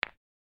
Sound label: Stone Landing; my cute commissar 2020 gamerip